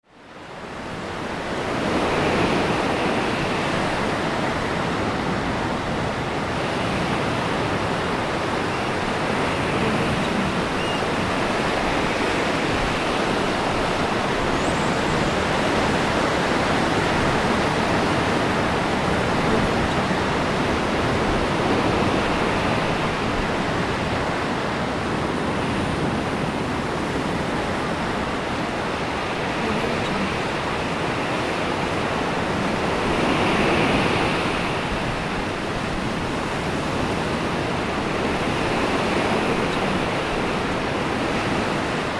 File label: ocean waves; bbrealsound